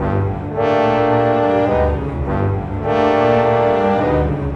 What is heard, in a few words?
Cinematic Orchestra; Sonic Foundry (Sony Creative Software); Orchestral Series VOL-3; Cinematic 11